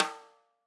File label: Tam Rim, drum